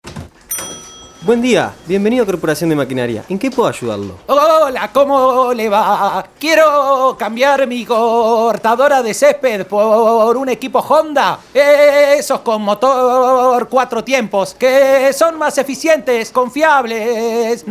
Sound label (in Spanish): Vibracion Honda; honda fuerza